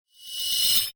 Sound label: Spellcaster, magic sound effect; fantasy sound effect; Andrew Fleming